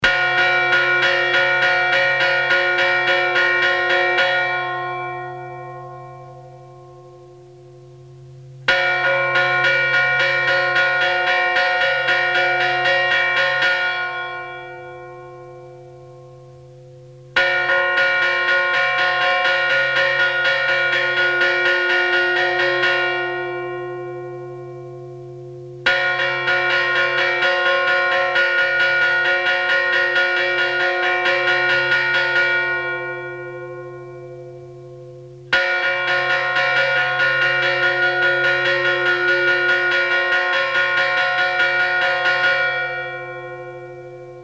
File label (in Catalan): campana